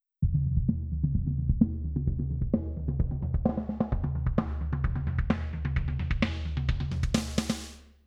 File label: Filter